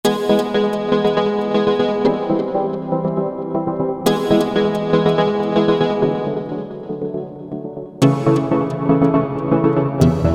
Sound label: Pluck Pad, Novation XioSynth; Novation XioSynth 25